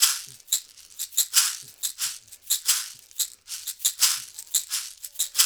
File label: LOOP, Percussion